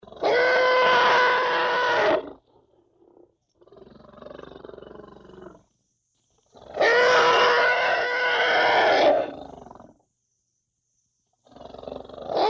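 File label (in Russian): звук крика слона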